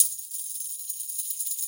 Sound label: tamb